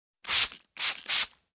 Spray bottle squirt fluid glass cleaner cleaning product